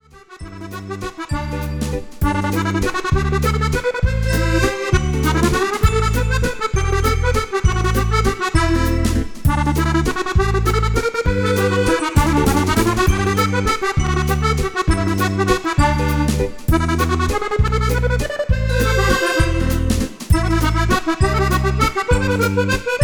extrait accordeo valse

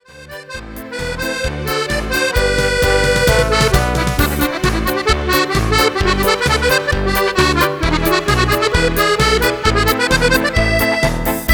marche

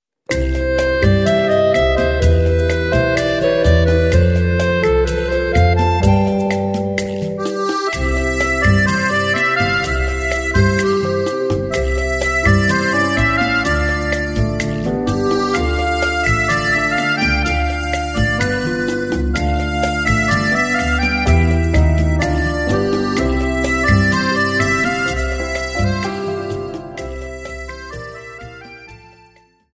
sensuel rumba

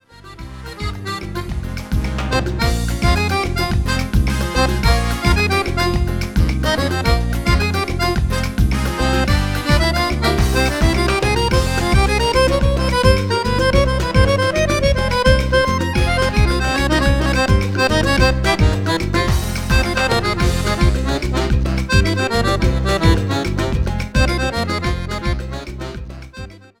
danse mambo danse

